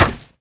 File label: hammer